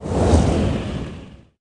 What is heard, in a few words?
fly